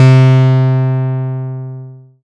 lead C3, 5U Modular; Polyend; Simulacrum Sounds; 1 Shots; Synths; Leads and Keys

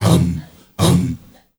MALE HUM; Spectrasonics; Heart of Africa

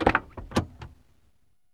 DOOR OPEN, Car; VSI Car

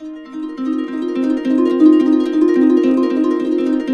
Complete Harps, C; USB Soundscan vol.62; AKAI

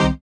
HOUSE, House Dance

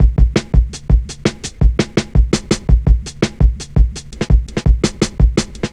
Drum Loops, Jungle

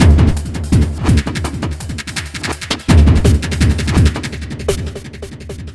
FX BEAT

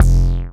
DM BAS, Dance Mega Synth; Best Service

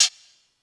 Hihat; Sonic Foundry (Sony Creative Software); Stylus Pressure Urban Grooves on Digital Wax; Summer Jam

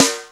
R8 Snare, Roland R-8

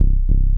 Standard Bass Repeater A One Shot; Drum & Bass

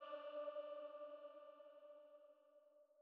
Female Pad D3

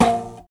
Mouth Bow, Africa; Roland LCDP11 Africa VOL-1

